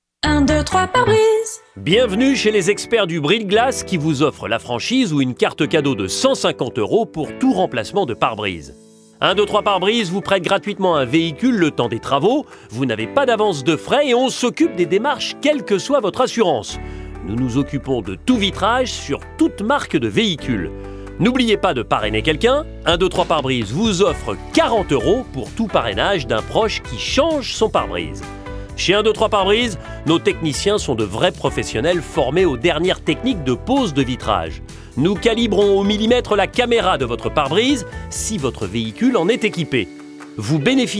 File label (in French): attente telephonique; 123 parebrise